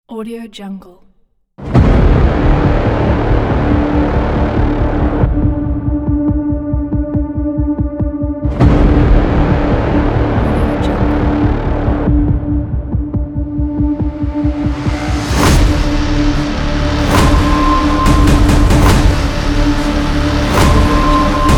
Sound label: the hybrid epic trailer